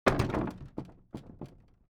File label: object case wood old drop